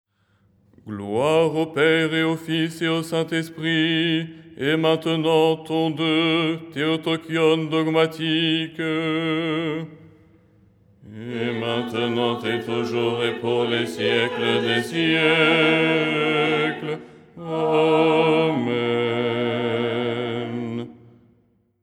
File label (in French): basse